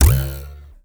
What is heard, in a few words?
Ruler Twang, cartoon Foley Fx; musicradar; cartoon caper